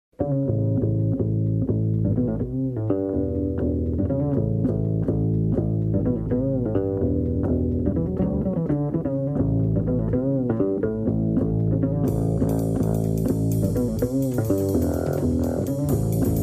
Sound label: Bass Folk Song